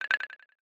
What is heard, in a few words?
boost; IceCubesApp